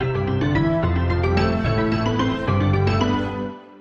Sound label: Jubilant Piano - Amin 110 bpm; MIDI Clips; Tonal; Motifs; Ableton; Factory Packs; Mood Reel